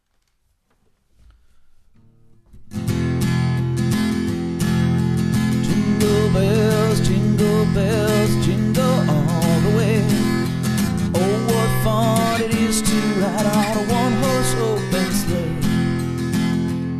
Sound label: Jingle Bells; Christmas Covers Nashville